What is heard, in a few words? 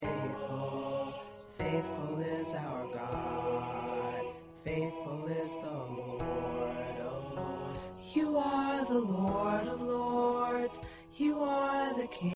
Tenor, choir parts